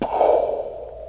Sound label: explosion